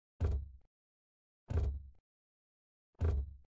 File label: thumping clay; grade3; shapes and sizes